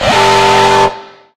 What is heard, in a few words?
bomb warning; video games; source engine; tf; mvm